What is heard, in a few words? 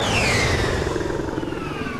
jump; freak fortress 2; angela